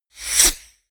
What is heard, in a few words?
sweep